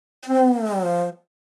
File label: Cartoon funny descend